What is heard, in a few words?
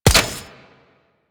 Fishing Spear Damage Hit; Warframe; Venus Fishing Spears